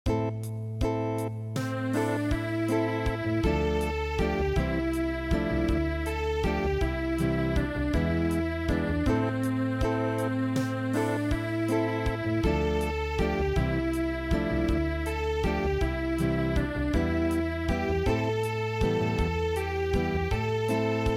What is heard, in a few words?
Acoustic, traditional; El Pajarillo